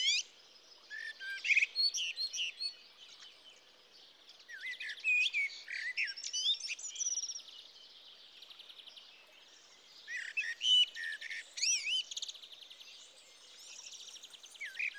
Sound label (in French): with energy merle noir; TOULON; PRESQUILE